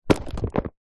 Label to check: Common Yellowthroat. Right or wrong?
Wrong